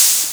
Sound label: Open Hihats, Cymbals; Vengeance Samples